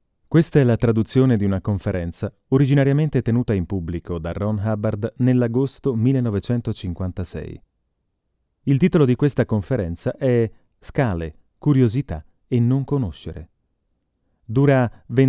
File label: scale